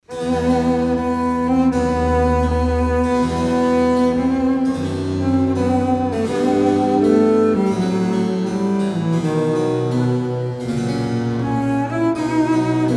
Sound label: Triosonate; GF Haendel